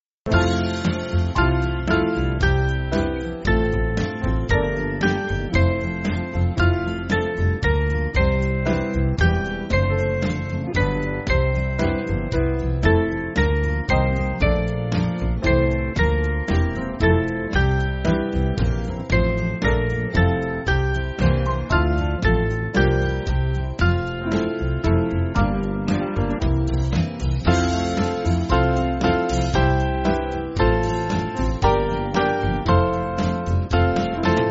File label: Band, church music